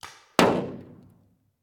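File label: Paintball Gun Impact; Sound Ideas; Guns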